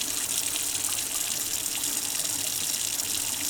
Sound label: Kitchen Water Tap Running loop mono; don t be afraid the first toy